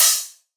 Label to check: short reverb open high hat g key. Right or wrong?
Right